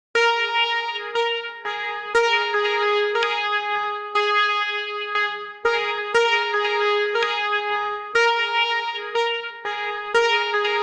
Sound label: positive sonic logo; branding